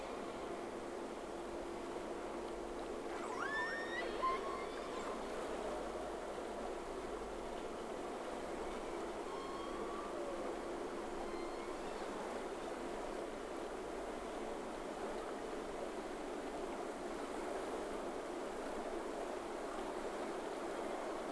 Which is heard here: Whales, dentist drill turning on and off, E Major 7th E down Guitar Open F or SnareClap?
Whales